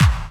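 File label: snare drum bass drum, Dance Mega Drums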